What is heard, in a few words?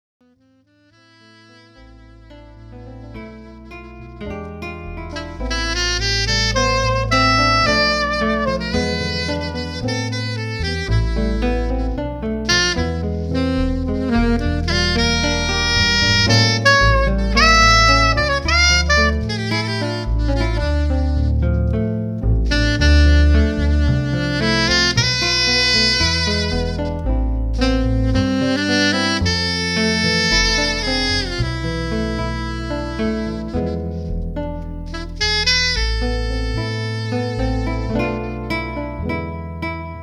Keyboard and Sax Jazz